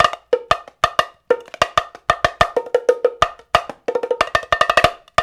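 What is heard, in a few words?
93 bpm, bongo tonga; Spectrasonics; Supreme Beats; African Contemporary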